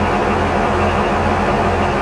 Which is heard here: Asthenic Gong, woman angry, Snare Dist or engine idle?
engine idle